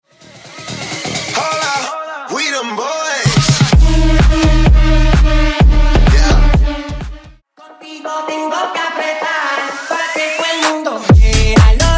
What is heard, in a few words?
Top 40 Club EDM Bootleg Megamix 128Bpm